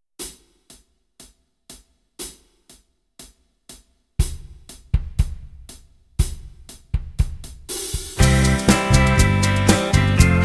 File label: Backing track; keyboard; Kenneth Baker - The complete keyboard player